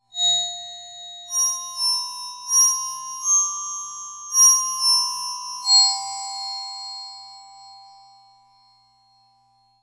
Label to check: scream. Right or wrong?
Wrong